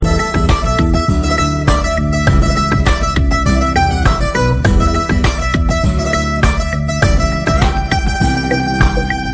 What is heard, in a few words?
guitar intro; rock